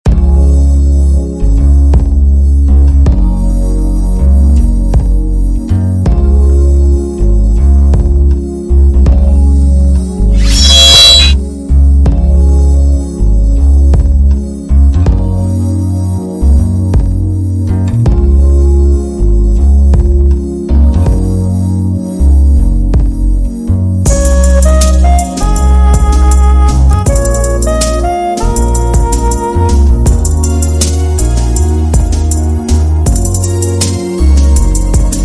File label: Soul Sax Mix